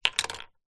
leisure toy plastic figure place down on wooden floor; zapsplat